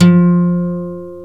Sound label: GUITAR FLUTE